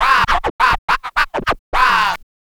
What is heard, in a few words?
Scratching, Vinyl Scratches